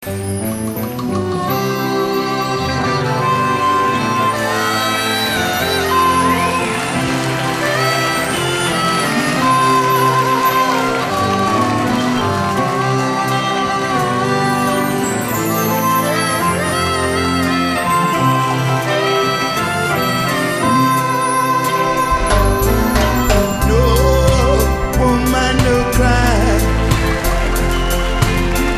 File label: reggae